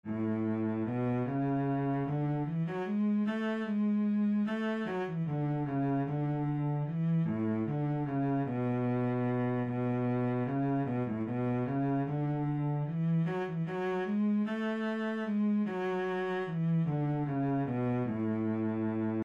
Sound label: minuet cello